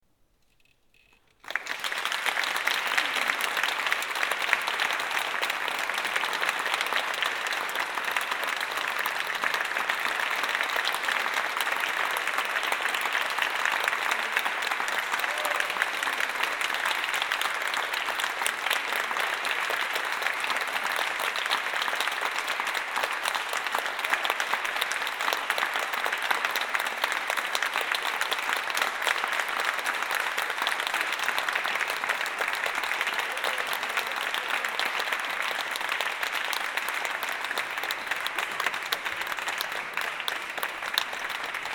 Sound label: Applause; Five Mystical Songs Paris, 28th April 2007